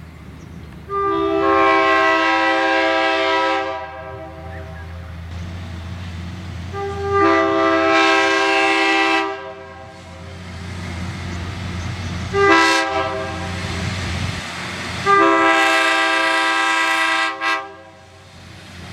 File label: Diesel, horns; trains